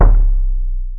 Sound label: dunk, Toms Electro